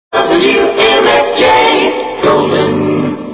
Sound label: Golden jingle; 1450 WMFJ